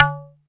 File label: Perc, Alesis DM5